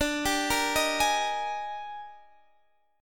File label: Eb Major 11th D